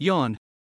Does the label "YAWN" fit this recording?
Yes